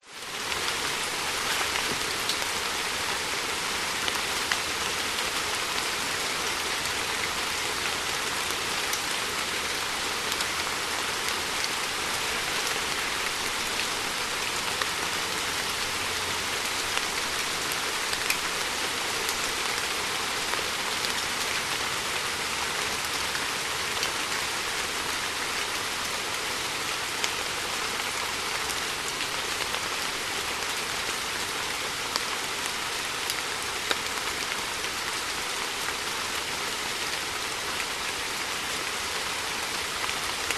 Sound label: rain heavy in jungle drips on trees leaves krabi thailand; lukas tvrdon